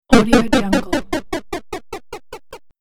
retro progress hits

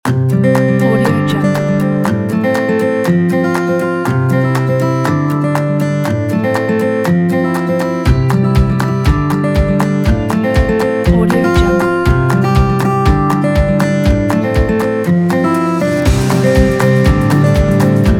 happy

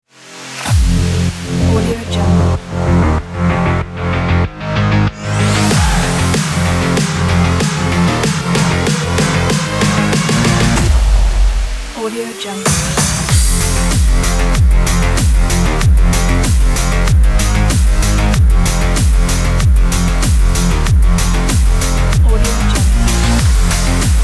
sport electronica

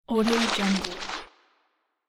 newspaper pages turning

